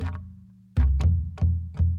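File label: Calm Far, music; from dust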